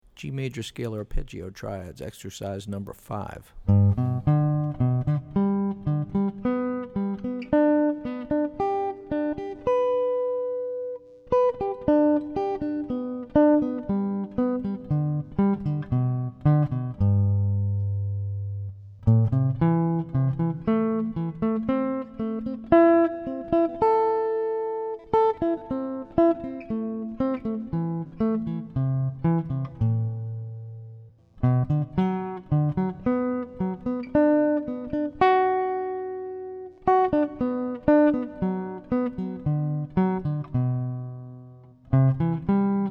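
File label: G Major Scale Arp Triads; lead skills materials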